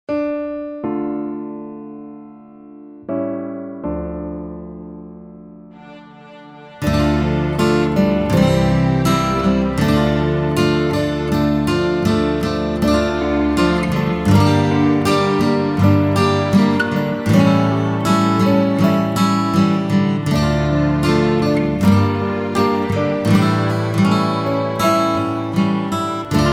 la benediction instru

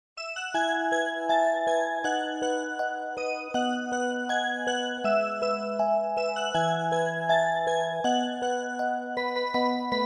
Toute petite (instr); chants

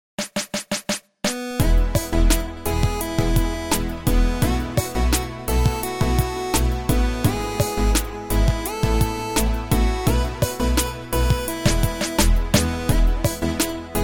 Bonjour mon ami (inst); chants

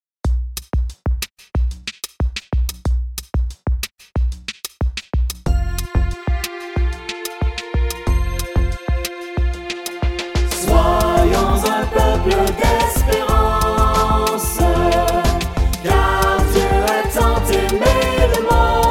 soyons un peuple couplet 1; avent 2008

